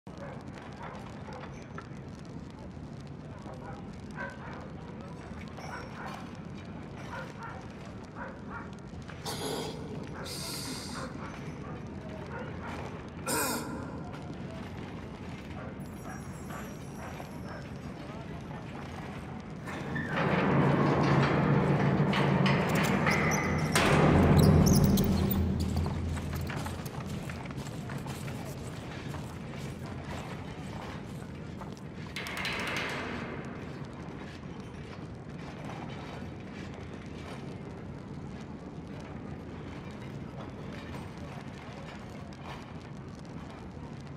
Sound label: City's Dungeon Prison Ambience